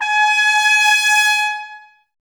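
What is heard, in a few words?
trumpets G#, brass; Miroslav Vitous; Woodwind Brass Ensemble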